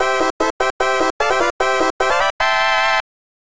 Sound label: PC Fanfare; Mystic Towers; DOS